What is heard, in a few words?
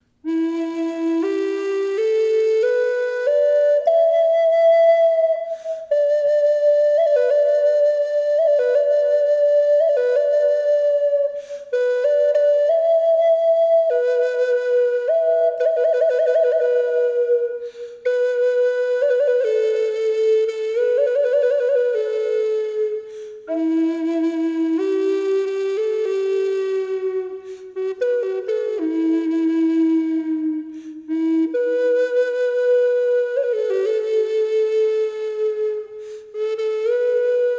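Cedar Classic, flute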